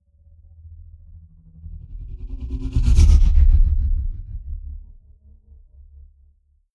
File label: sci-fi vehicle drive by tonal flutter; raumschiff